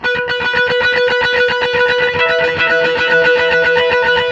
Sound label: Guit Harmonics Delay